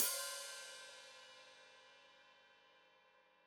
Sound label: Hat Foot Open, Hi-Hat; Deeply Sampled Drums